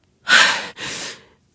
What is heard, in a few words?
breath; Portal 2; Source Engine; video games